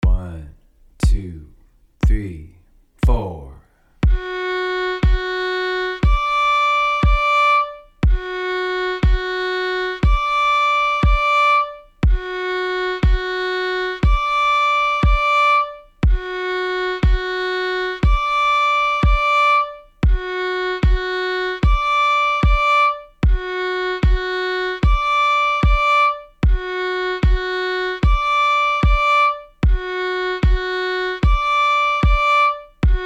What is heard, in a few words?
Intervals, fiddle; road to lisdonverna